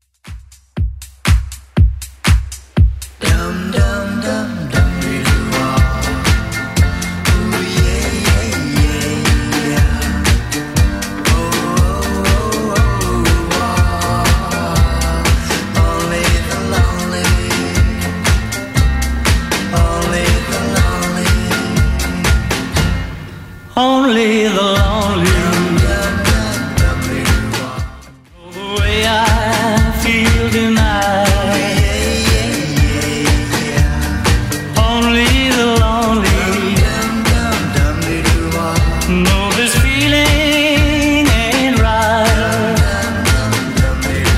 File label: 60s Club 120 bpm CLEAN